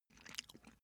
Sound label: cat eat single